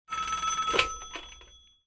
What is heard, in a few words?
old telephone ring